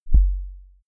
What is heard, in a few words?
sub kick clean transient